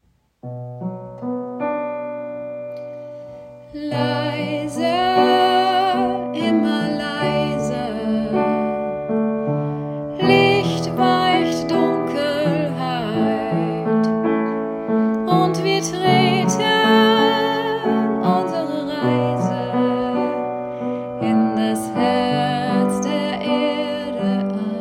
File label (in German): Leiser immer leiser D